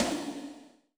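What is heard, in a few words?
Snare, Alesis SR16; FrngeSnr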